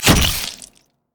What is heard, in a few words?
axe grab; Darius